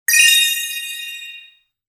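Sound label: an idea arose; Короткие звуки для монтажа; transition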